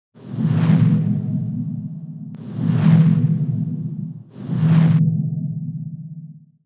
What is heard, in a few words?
Bronze Dragon Fly